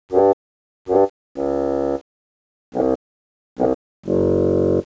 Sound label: Joy, happymusic; 特酷声音素材库; 背景音乐